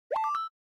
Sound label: Smartphone UI Sound Dry